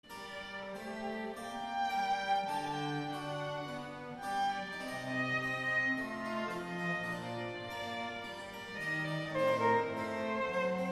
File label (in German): Kammermusik Triosonate g moll Grave